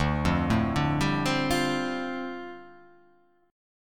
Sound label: D Minor 11th D down, guitar